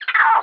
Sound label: Macaque Death; Playable Tutorial Level; TR3